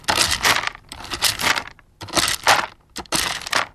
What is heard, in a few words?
digging cat litter; 猫砂を掘り返す時の効果音。猫砂に限らず砂を掘るような音として使えそう。; リアル 動作 地味 変わった